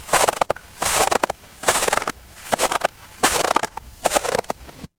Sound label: footsteps snow